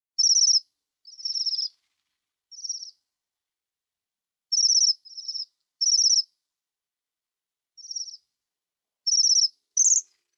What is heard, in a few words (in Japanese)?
kirenjaku, birds